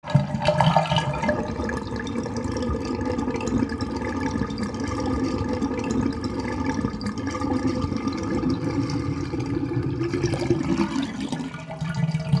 Bathtub water draining

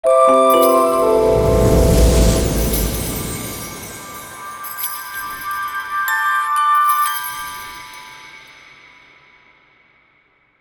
Christmas logo intro effect ident

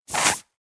Sauce bottle empty squeeze squirt air; cooking

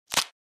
Finger bone break and snap

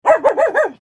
Medium sized dog bark

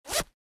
Bag polyester zipping open fast

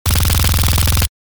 Special forces submachine gun suppressed burst

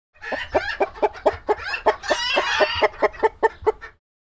Chickens clucking chicken coop; animal

